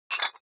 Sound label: dumbbell pull up rattle soft, fitnessstudio